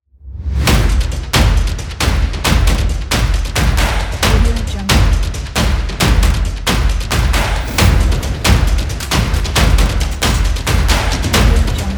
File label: football drums